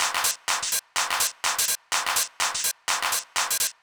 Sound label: DEEPHOUSE BEATS 125